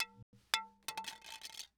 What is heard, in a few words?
Electronic CD Fall To Ground